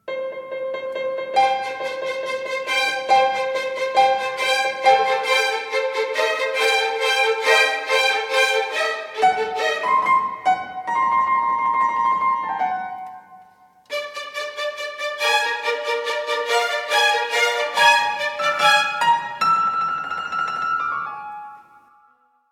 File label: Carnival; Google; Pixel-4; alarms; Classical Harmonies